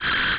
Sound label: Mag pulse; Starbound II